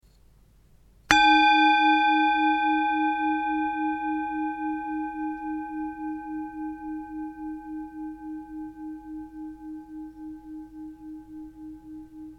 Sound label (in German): Klangschale